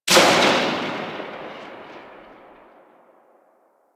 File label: Pistol Tail, Weapons; Harlequin; Warframe; Tenno